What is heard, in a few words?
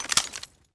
reload garand, weapons; dod 1.3; dod enhancement pack